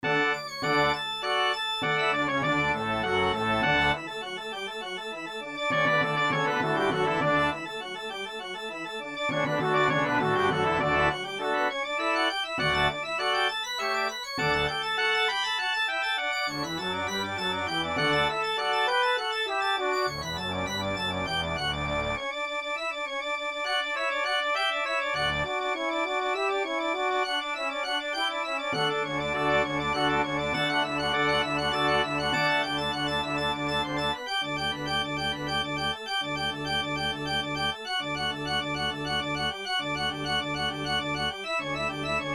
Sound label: organ and brass